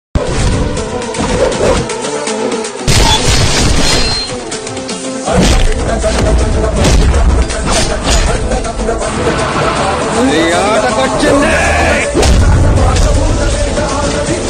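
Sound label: climax background music; skanda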